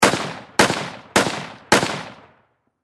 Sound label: Warfare Rifle .30 Caliber M1 Garand Semi Automatic Four Shots Distant Perspective